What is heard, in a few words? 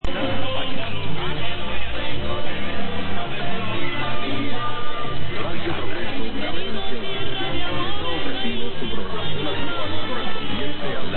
Radio Recuerdos Colombia and Radio Progresso Cuba, radio